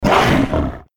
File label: bark; shaoxiadiablo